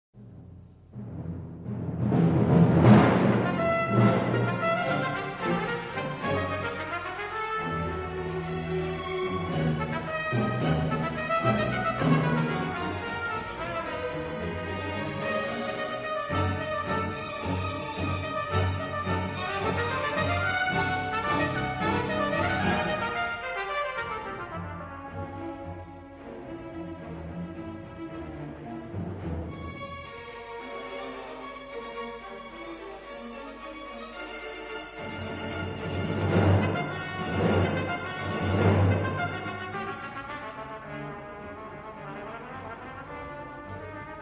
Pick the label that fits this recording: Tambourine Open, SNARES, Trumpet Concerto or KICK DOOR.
Trumpet Concerto